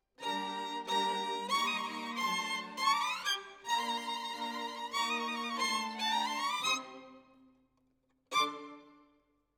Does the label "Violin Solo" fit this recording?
Yes